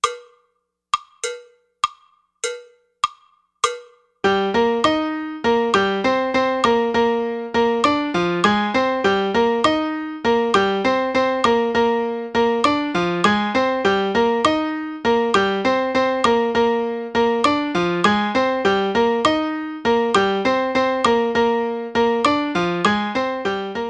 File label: son montuno slow